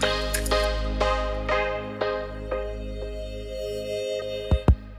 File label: Synth to Pad; Solos; BB 2HTC Samples Vol 4 Addendum